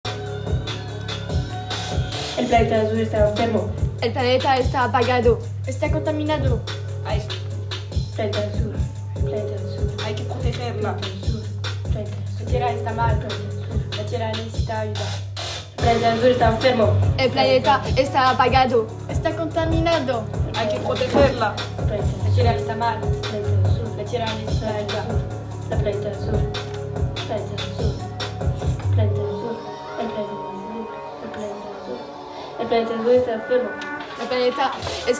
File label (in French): rap en espagnol; atelier